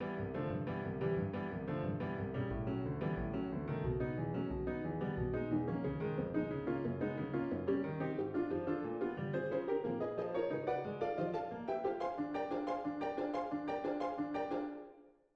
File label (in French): piano cordes en pizz